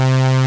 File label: Detuned Saw; flstudio502